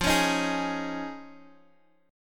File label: F Minor 7th Sharp 5th, chord; x,x,x,x,5,8,5,5; down; chord shape